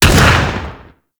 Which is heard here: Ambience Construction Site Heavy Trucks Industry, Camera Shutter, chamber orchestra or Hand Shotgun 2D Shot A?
Hand Shotgun 2D Shot A